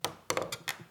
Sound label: Wooden Creak